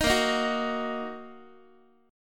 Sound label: A sharp Suspended 4th Sharp 5th A sharp down Guitar; strummed; JGuitar; x,x,6,8,7,5